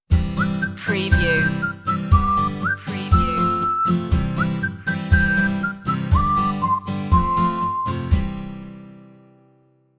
whistle happy stinger

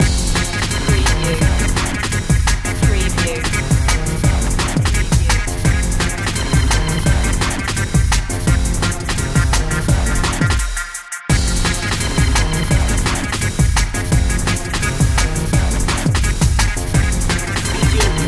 high contrast

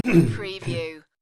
Man Clears Throat

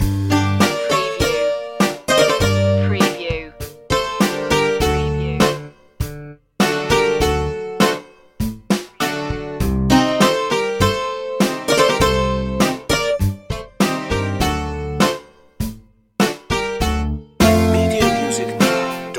greek summer loop

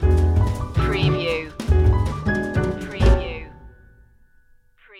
jazz up stinger

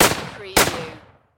Rifle M1 Garand

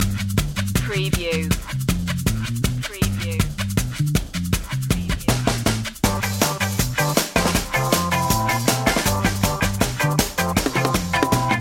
happy ska

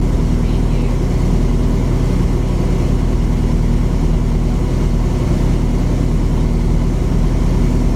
Rally car tickover loop; beanstalk audio

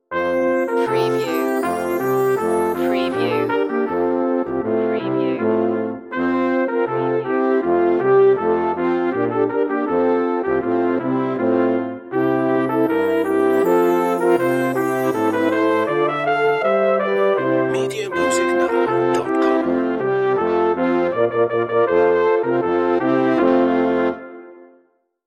deck the halls brass band

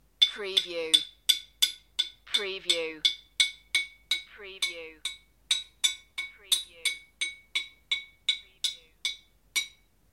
Hammering On Metal

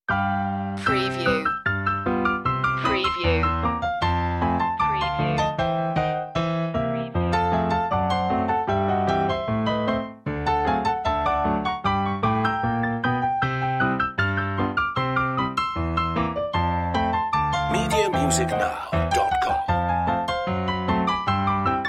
limehouse rag

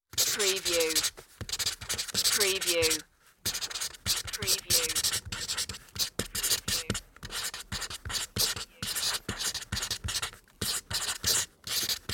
marker writing

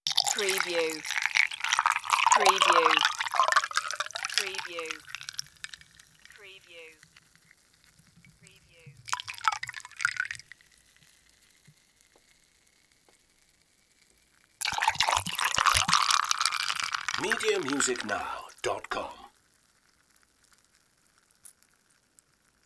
pour water into ice cubes

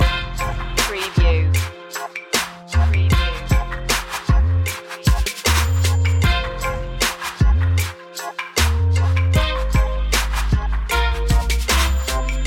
funky trap loop

